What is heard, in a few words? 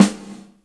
GATED SNR, SNARES; Instruments & Percussions; InVision Interactive; Lightware VOL-1